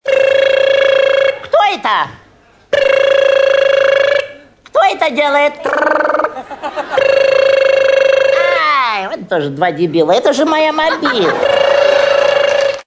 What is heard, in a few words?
startup